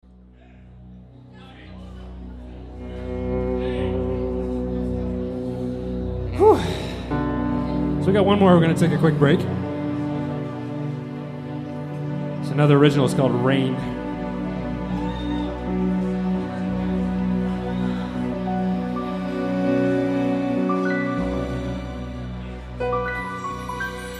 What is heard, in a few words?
Rain Live